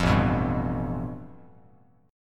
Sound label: A 7th Suspended 2nd Sharp 5th, Bass Standard; A7sus2#5 strummed; JGuitar